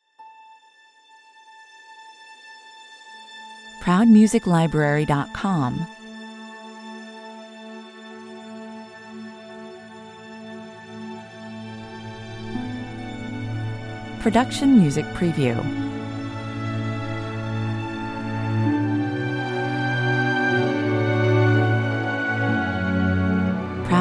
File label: no piano